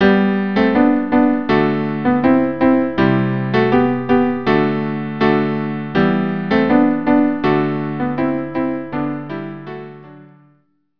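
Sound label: cello piano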